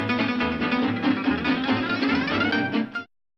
chromatic gliss long; django

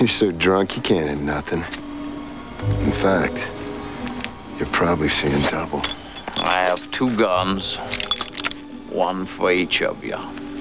2 guns

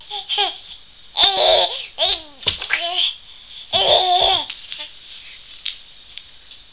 mollie bottle

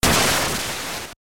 explosion

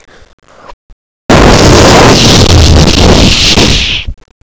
explode

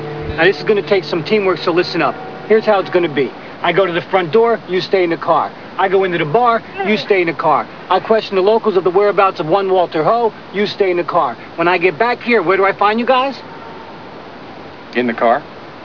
car

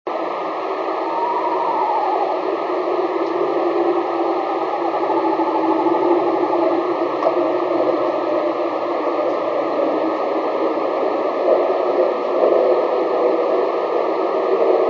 owl eastern screech; birdsongs

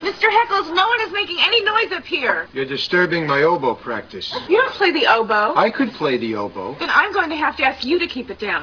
oboe

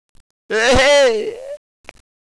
weyhey

